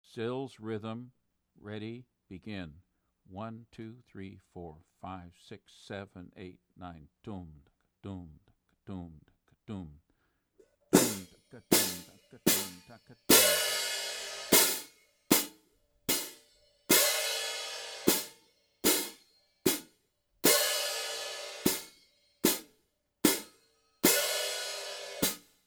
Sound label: Karsilama zils